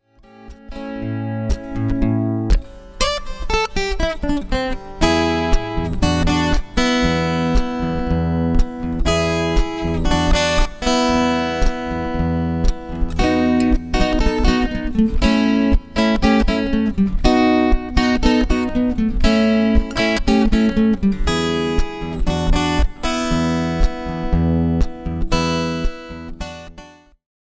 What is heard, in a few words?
Sixtie blues